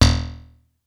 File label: BUZ; Masterbits - Total Zone